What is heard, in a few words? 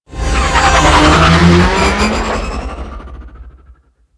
Ship Attack Maneuver Generic, Engines; Warframe; Vehicles; RailJack Enemy Ships; Corpus; Maneuvers